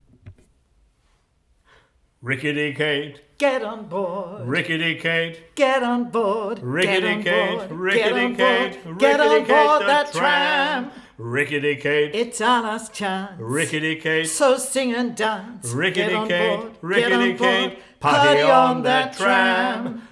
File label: vocal duet